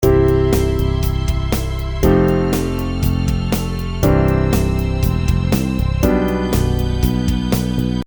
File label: Pad the Top End